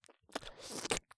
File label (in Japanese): べろん, 効果音